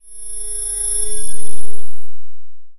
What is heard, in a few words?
spaceship door